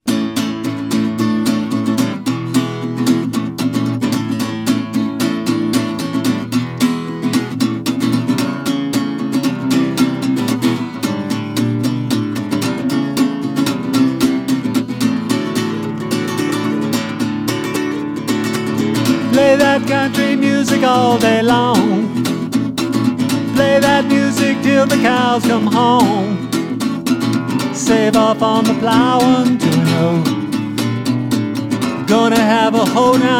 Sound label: country music